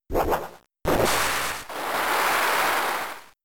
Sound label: Water Spout